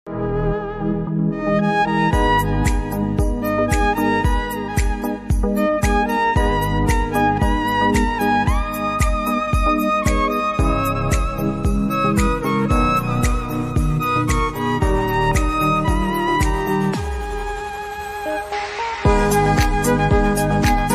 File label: Violin Music Tone